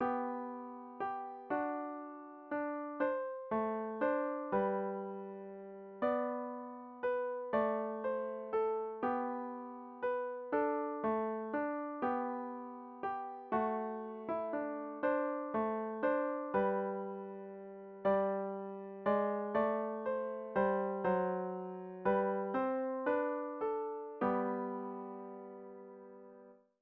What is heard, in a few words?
piano